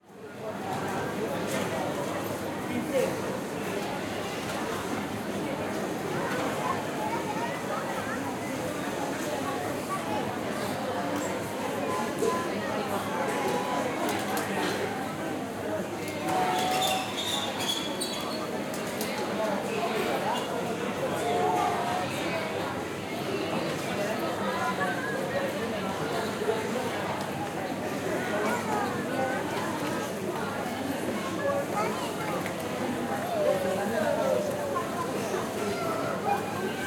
SPANISH CROWD Train Station Main Concourse Dozens of People Passing By